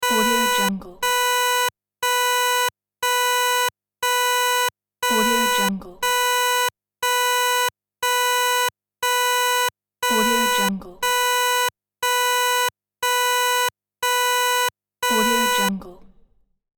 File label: alarm security basic